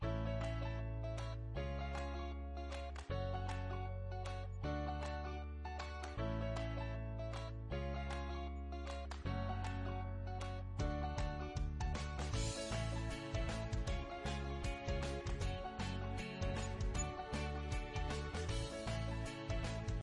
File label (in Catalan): musica de fons anuncis